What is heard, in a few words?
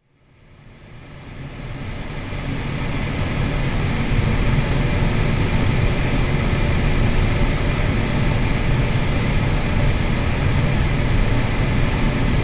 hvac drones